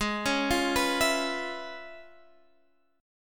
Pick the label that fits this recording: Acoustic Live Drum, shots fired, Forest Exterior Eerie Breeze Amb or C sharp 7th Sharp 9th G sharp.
C sharp 7th Sharp 9th G sharp